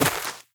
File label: step light, footsteps player grass